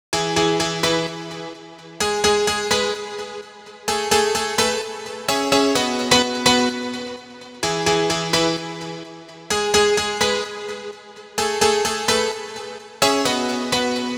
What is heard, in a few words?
piano, Key E Bpm 128; Loop Nerds; Big Room House Allstarz